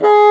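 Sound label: WIND BASSOON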